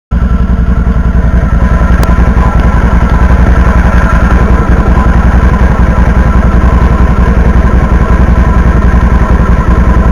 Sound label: Ducati Multistrada